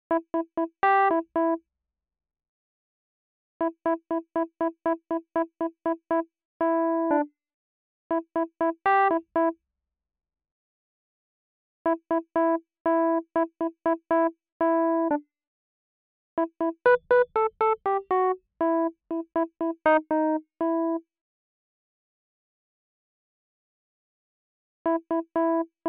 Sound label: MELODY